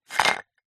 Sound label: food biscuits cookies in glass container movement